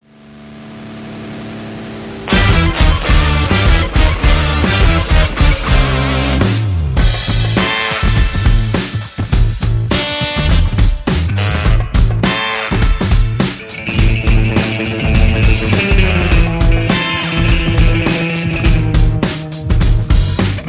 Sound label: No Vocals